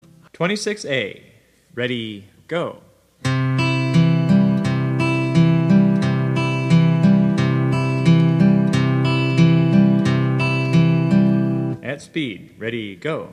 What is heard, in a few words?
travis picking